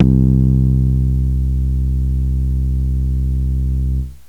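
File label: house bass; awe32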